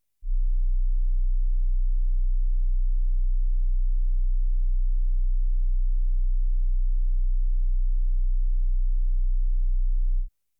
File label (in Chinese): 31Hz 測試訊號; 雨果金碟